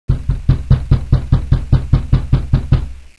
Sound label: knack door; svencoop